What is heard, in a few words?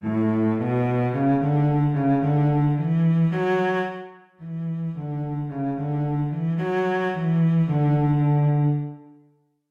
Cello Sight Reading Exercise; Allegretto in D Major; SightReadingMastery